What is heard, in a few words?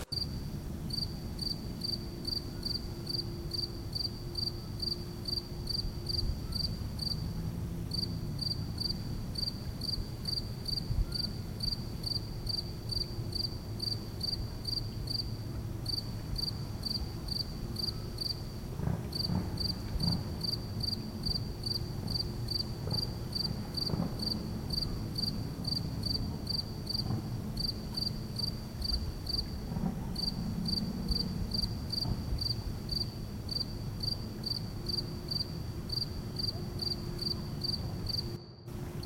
crickets; Undertale